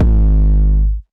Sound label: Kick 808 Gritty